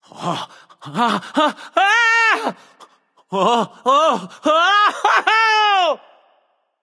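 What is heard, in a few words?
non verbal fear, voice; cernunnos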